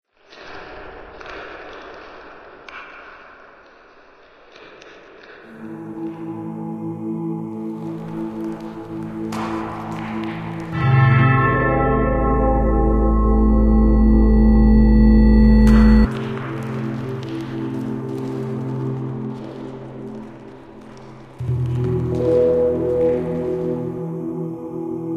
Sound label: nether bell dungeon soundtrack; Minecraft